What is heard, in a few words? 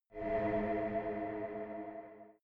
space shuttle signal; raumschiff